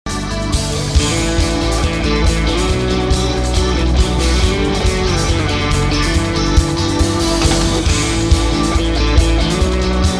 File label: guitar solo